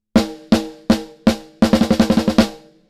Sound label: snare; Lindell 50 Series